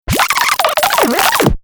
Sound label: Turntable Scratching Reverse; Dj; DJ (Sound Effects:Musical)